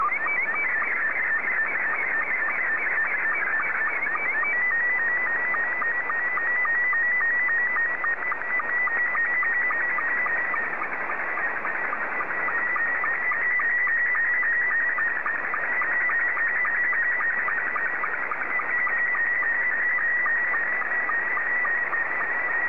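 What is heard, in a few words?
SSTV, Scottie 2; JA3QJQ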